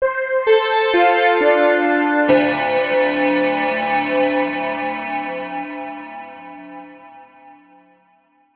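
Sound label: clock bell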